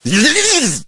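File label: electro wizard attack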